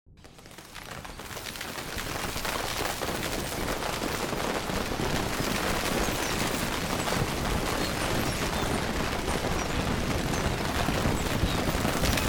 Created Ambience Perpetually Motive Festival, Various Ambience created through sound manipulation; in FMOD; Artificial